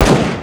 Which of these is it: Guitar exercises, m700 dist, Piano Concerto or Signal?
m700 dist